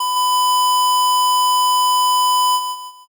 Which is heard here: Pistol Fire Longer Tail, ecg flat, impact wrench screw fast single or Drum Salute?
ecg flat